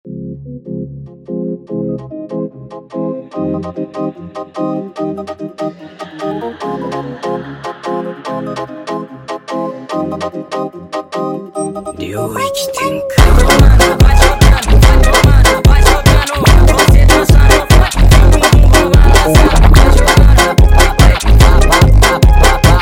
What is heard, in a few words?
Walk Funk sped Up